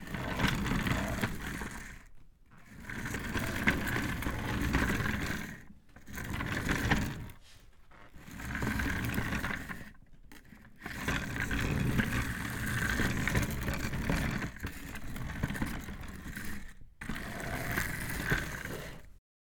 Office Chair Sliding On Floor

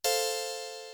Coin

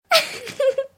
Laugh

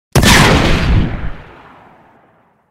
Shockwave Inspired Laser Sound

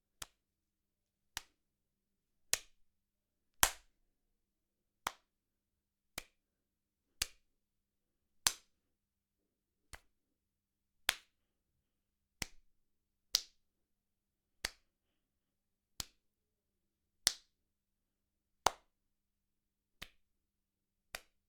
High Five Clap

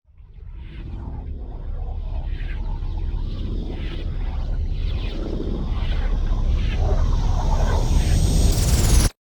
Dsgnrise Dark Tv Abyss

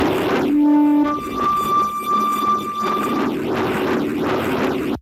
Monster Feedback

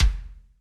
Yamaha Kick Soft

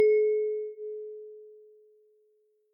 432 Hz A Bell

